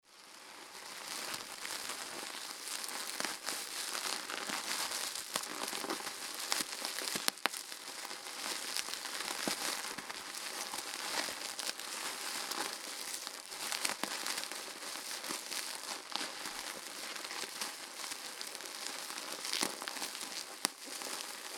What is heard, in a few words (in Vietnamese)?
Tiếng bao bì ni lông ma sát sột soạt